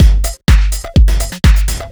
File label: Washer